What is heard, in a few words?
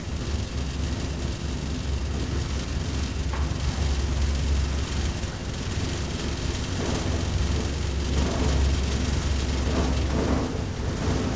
lift one; hl1mp